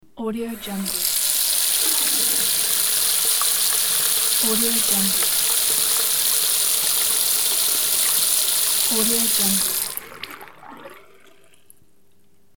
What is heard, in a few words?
water running a tap